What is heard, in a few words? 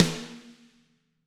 Snare Crack